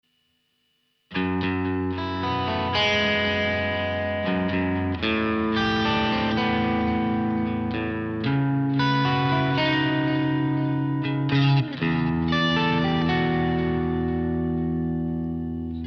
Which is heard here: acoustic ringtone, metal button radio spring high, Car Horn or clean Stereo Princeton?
clean Stereo Princeton